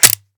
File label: close, m29; svencoop; ins2